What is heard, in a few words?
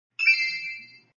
SFX Item Pickup